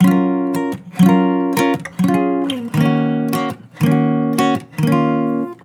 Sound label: Acoustic Chord Loop 170 BPM F# Maj; Cymatics